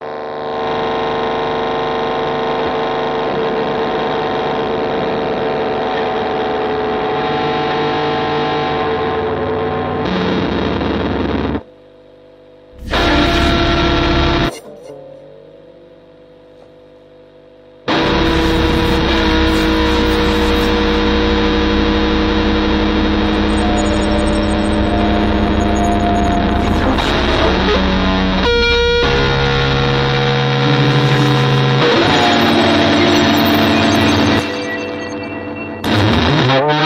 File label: e-git only; 50er